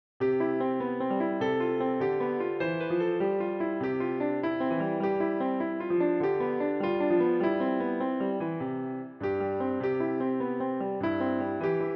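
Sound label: piano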